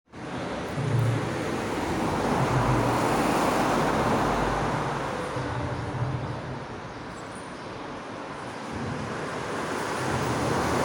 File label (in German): Schlangenbader Straße Unter der Autobahn; plattenbau